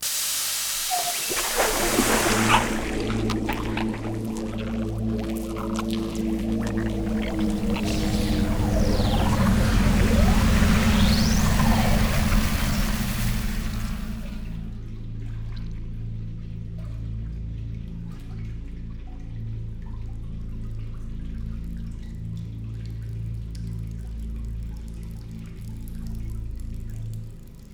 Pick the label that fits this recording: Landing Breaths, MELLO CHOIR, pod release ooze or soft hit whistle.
pod release ooze